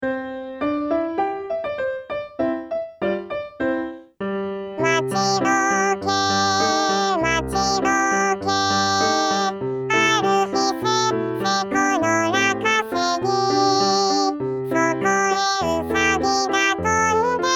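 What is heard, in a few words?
female soprano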